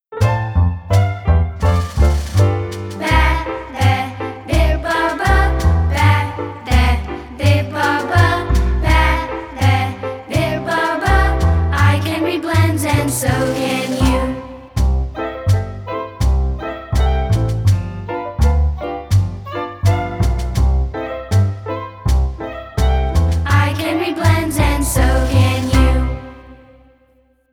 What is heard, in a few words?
Blend Song; Phonics 1